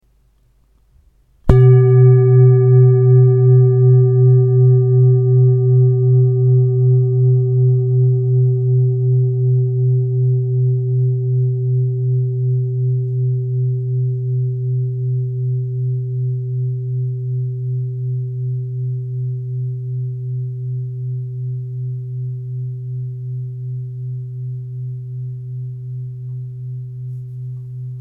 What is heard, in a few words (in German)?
Klangschale